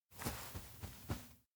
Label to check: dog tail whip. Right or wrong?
Right